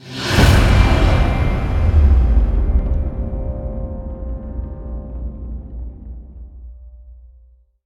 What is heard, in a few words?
Achievement Completed; Custom UI Sounds; Dying Light